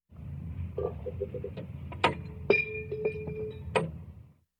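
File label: shell casing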